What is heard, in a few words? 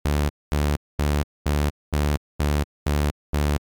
saw simple